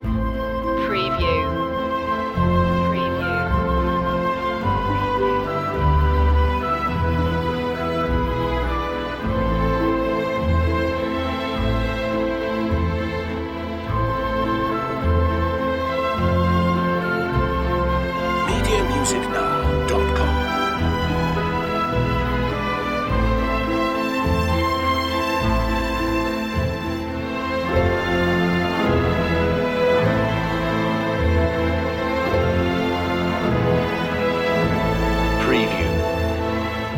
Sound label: woods lead